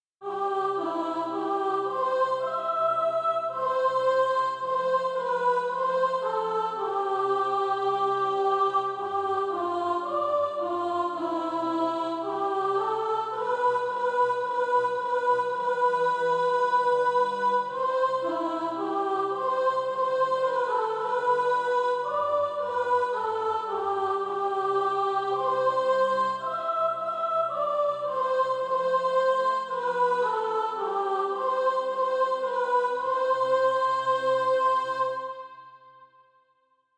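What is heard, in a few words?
soprano, Practise Music; Come with Happy Faces; SATB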